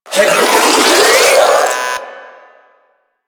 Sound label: Proto Infested Ancient Mid Spin Scream; Enemies; Abilities; Warframe